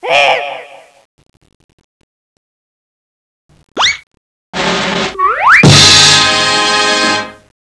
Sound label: scene change; cstrike; Teddy CSO